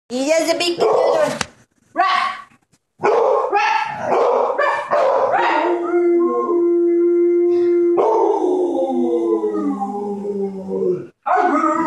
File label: the howling game, American Bully; King; funny